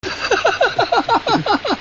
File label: laughs; Caligula